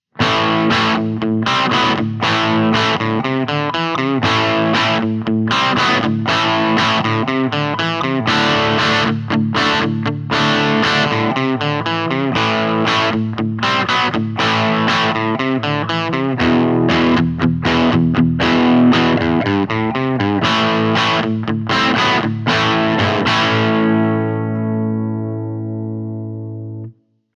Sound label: characteristic sound; jensentone